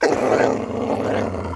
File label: dog; Mafie 1